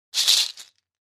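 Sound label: Cabassa Shekere Single, percussion shaker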